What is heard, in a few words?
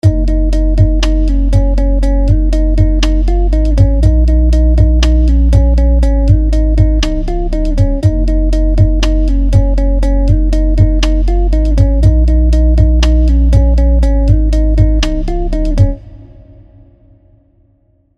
Master Limiter only